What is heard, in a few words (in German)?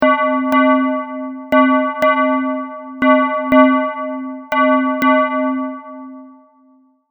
Glasen